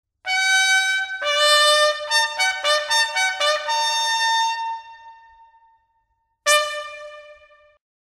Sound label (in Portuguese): Ombro Arma, toques